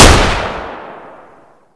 famas

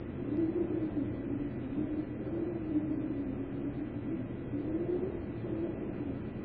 wind; cstrike

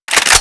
reload SG552; csof

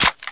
tec9 clip in; czero opforce

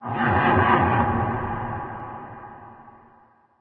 creep, ambient; quake2; openffa

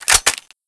sg552 bolt pull; csof

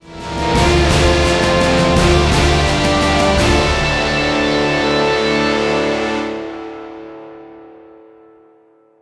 round win; music; ZB human

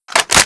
SG552 clip in; MZC

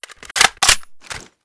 mag in, mp7

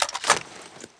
m95 clip out, weapons

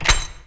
grapple hit, weapons; quake2; openffa

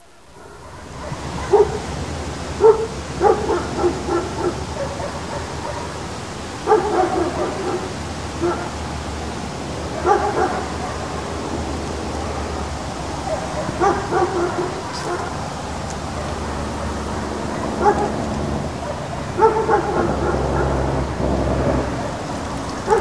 dog; cstrike; de tabocas

